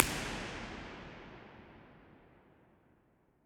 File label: arundel impulse response 44.1kHz